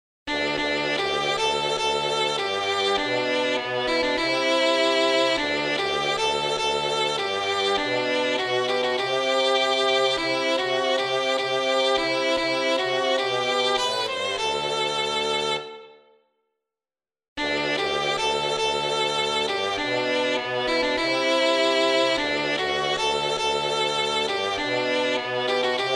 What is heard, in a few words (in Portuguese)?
violino e trombone